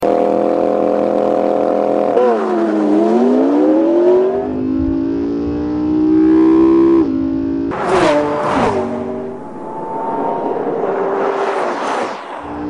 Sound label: Aventador Vs Mclaren